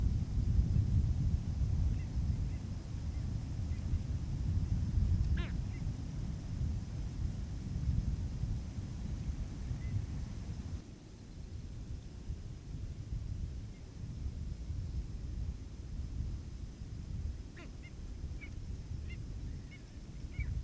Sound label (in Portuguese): canto do flamingo andino